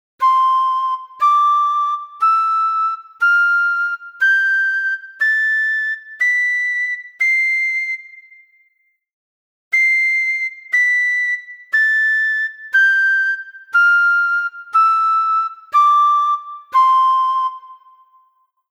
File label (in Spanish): escala ascendente escala descendente; Discriminacion auditiva